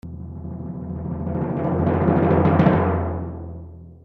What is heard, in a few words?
tympani; ecrins; Mars